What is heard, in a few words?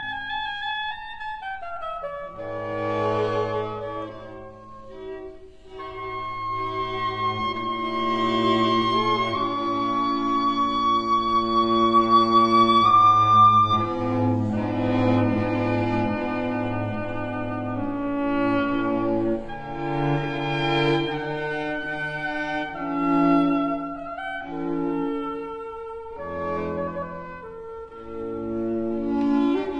Oboe Quartet in F major Adagio; K 370